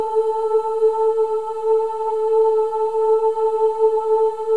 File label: OO A4, WOMEN; Spectrasonics; Symphony of Voices; WOMEN OOS